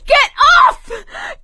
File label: scream while pounced, survivor voice; Left 4 Dead 2; producer